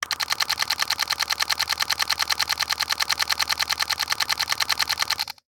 Counter mid; CB Sounddesign

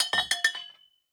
Metal, Hits, Pipe, Mixed, Fast, Irregular; Airborne Sound; Elements Metal

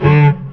barnacle grunt; hl2

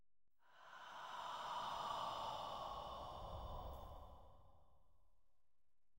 Eerie ghost scary transition; DsoundE; House of Horrors

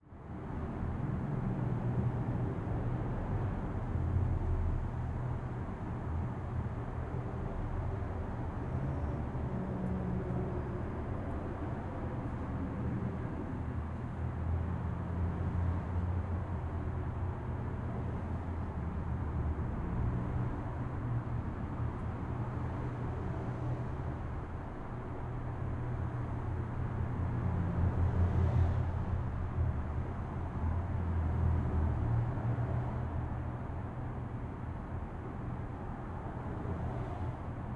Traffic Tunnel Under Freeway; Surround; 5.1 LCRLsRsLf; City; 2496SoundEffects; Surround In the City 5.1

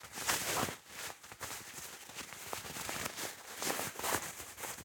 Fabric Mask Movement, Foley; InMotionAudio

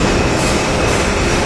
crane, train; hl2; func train

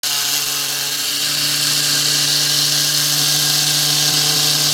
motor long, Electric Motors; 3maze

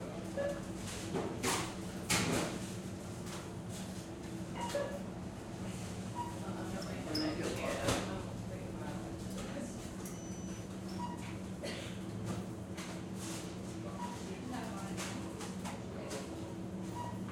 Shop Ambience, UK Shop; InMotionAudio; UK Shops

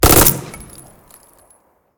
MP5 Burst - Shot - Short - Urban Exterior; SoundMorph; INTERVENTION

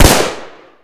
pistol fire; hl2

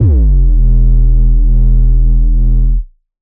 Bass Gritty Wobble; Glitchedtones; Bass Weight

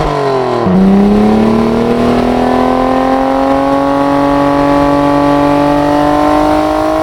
atv third gear, vehicles; hl2

